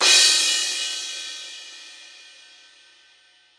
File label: Crash